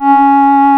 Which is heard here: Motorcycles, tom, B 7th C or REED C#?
REED C#